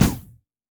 turret; LoL; heimerdinger; uranium

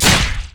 basic attack human; LoL; shyvana

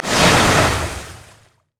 surging tides; nami base

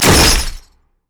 bola strike; LoL; hunter rengar

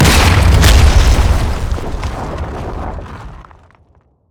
turret break; order turret

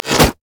blue mech melee basic attack; LoL

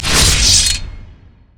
dragon strike; LoL; jarvaniv

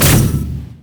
light strike; LoL; lux

